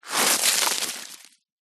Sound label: foley stones kick mound roll down